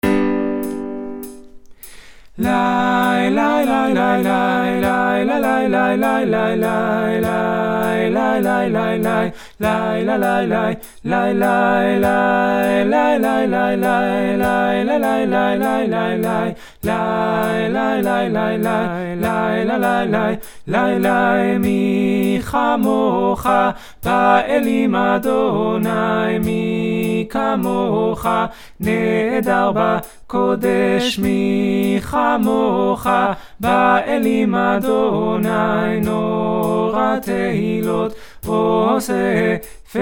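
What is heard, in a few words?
michamocha all voices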